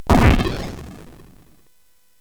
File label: SFX; Narco Police; hvsc